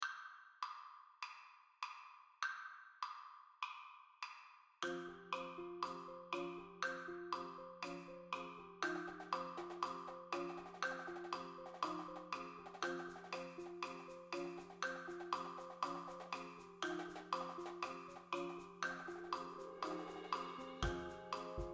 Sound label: Percussion Only bells crotales; Slow; Mallet; Brass; Mvt 1